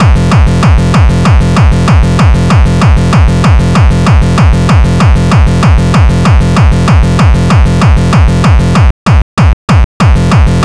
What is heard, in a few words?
pattern kick